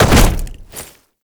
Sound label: Land Heavy Glass; Movement; Warframe; Uriel